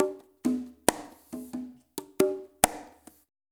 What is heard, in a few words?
CONGAS, LIQUID; Spectrasonics; Liquid Grooves 1 & 2